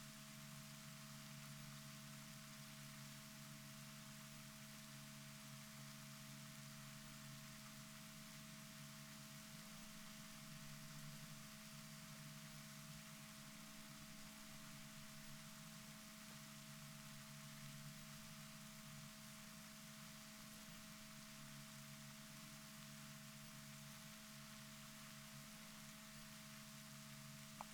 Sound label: rorquals positif, Balaenoptera Physalus Chile